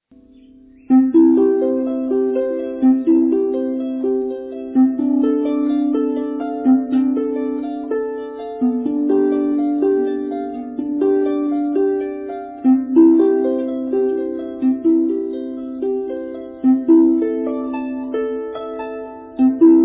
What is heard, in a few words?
Prelude in C, harpist